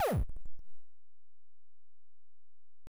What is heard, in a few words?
Rocket; Added sound when jumping/firing/picking-up